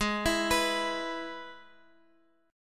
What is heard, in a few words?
Ab Minor Sharp 5th Ab, Guitar Open F; arpeggiated; x,15,16,18,x,x; down